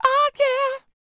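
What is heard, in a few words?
falsetto, R&B Dance; Spectrasonics Vocal Planet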